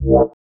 Allert Dub; FREE SFX PACK VOL 2

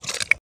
mud

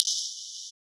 Click Lock Fail; FREE SFX PACK VOL 2

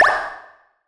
Retro Blop

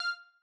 Button Confirm

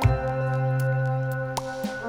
Swirling Ding; BB 2HTC Samples Vol 4

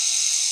machine

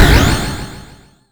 Retro Magic

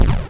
explode, 8-Bit Sounds

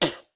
spit, creature

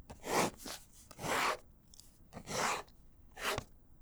Pen Cap Lines, writing; Books, Paper, Writing

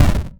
hit deep

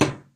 metal

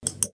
mouse click

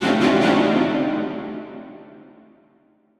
Stabs Strings Mid, horror strings

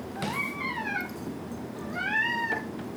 Cat Meows - Food Time; Micro Pack

